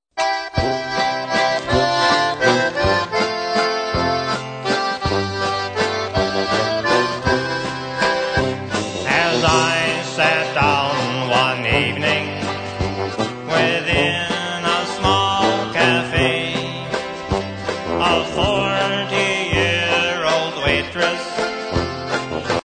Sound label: waltz